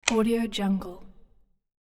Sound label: light switch lamp click deeper; hollywoodedge